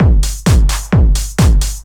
drum 130 techno; Techno Trance Essentials

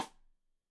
Side Stick Stereo; Deeply Sampled Drums; SMDrum

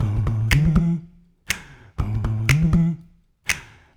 HMM, 120; Spectrasonics - Supreme Beats - World Dance

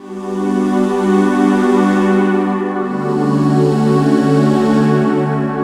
Pad, Melodic Synth; Drum & Bass

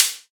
Acoustic Drums - Closed Hat; Antidote Advent

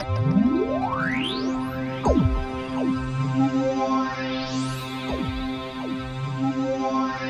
BASS JAM, SynthLine; 90 s Sample CDs; NiceBeats; SynthLine VOL-2

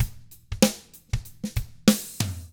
95 funk, drums; ILIO; Double Platinum Drums 1

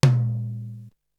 Tom, Drums; ZERO G - Datafile DISC 3

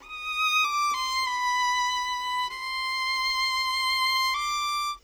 Violin; Sonic Foundry (Sony Creative Software) - Orchestral VOL-2; Modern 36

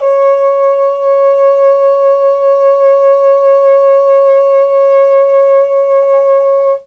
C#4 TROMBONE, Mellotron; CHMB TRMBONE; Mike Pinder - Mellotron Archive CD

